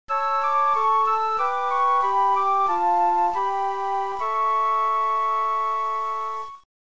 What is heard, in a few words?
save music